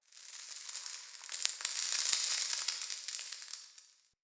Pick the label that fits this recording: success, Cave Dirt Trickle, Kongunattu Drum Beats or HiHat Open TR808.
Cave Dirt Trickle